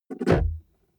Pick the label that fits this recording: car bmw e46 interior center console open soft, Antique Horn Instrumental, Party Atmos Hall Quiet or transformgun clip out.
car bmw e46 interior center console open soft